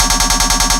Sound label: Melodic Riddim Cymbal